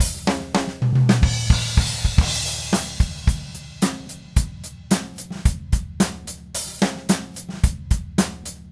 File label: Red Maple Groove 110, DW Red Maple Kit